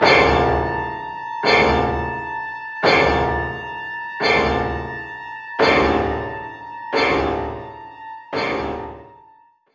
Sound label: Hit; Left 4 Dead 2008 pc